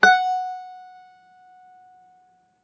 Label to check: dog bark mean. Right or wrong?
Wrong